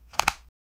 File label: card flip from the card